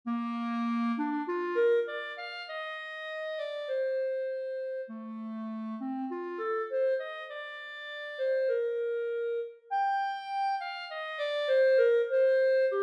clarinet etude

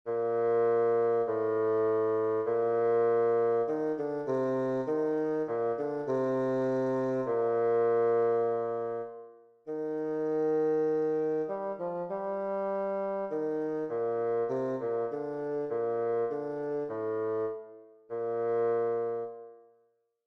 bass c

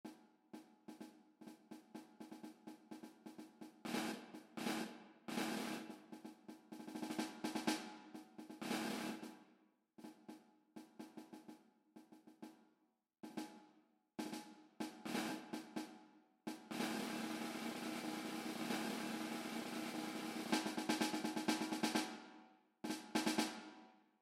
percussion etude

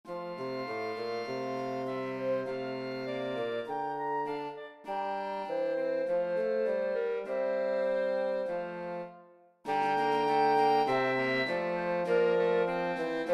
7th 8th grade ensemble

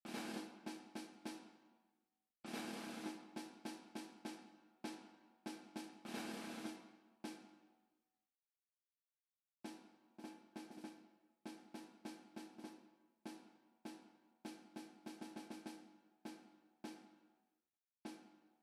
grade snare

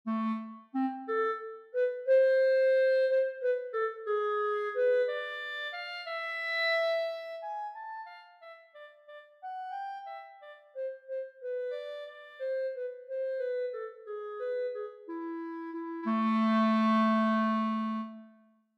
clarinet etude

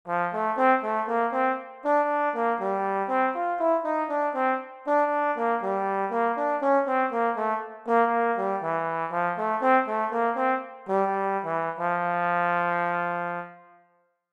trombone

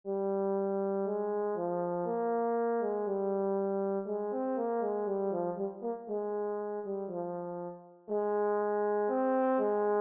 horn etude

